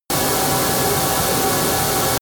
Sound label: buzzer